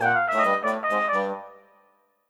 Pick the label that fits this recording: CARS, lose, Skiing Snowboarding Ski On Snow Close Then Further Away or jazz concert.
lose